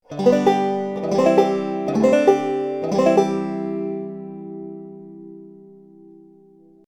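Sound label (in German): roll mit akkordwechseln, banjo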